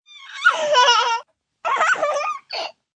Laugh Squeal, Laugh, 1 girl, 2 yrs, delighted high pitched giggle, human voice, child, kid; Laughter Sound Effects; female